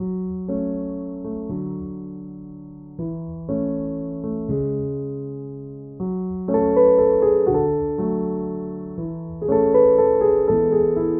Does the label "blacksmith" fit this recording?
No